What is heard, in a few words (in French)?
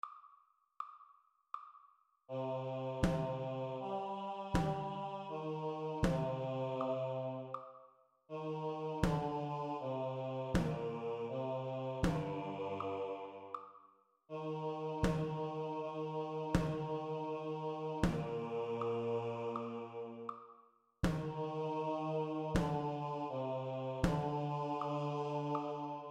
audio percu